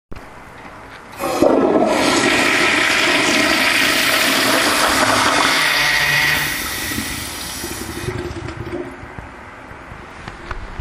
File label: toilet